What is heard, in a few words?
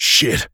Pain; Duke Nukem; Forever 2001